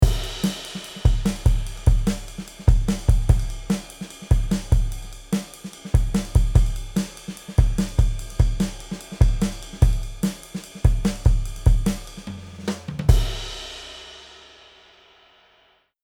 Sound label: Chandler TG 1 Drums THD